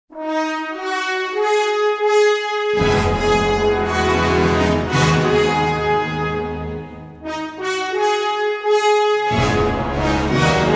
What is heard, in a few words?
fanfare